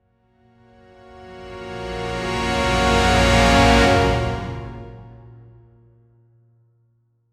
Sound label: epic swell crescendo